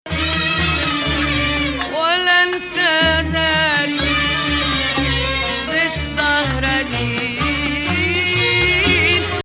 Rast, maqam

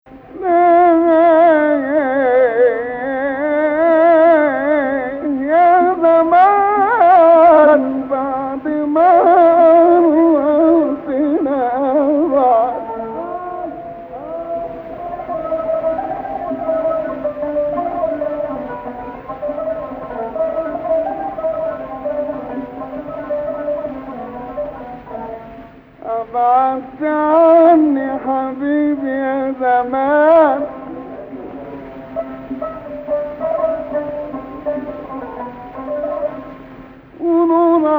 Rast Rast Octave

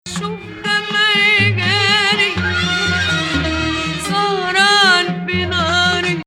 Nahawand, maqam; Rast

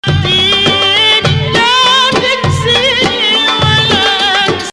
Rast Ya Maal Rast Octave

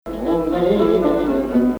Bayati, maqam

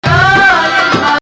Nahawand, maqam

